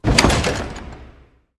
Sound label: ancient plat close, doors; svencoop; rngremake